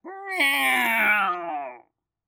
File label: Cat, yowling; Judge John Hodgman